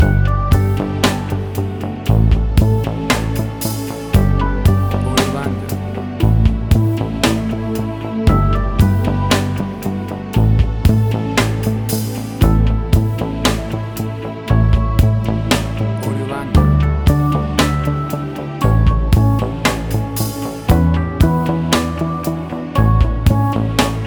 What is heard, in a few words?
A New Electric World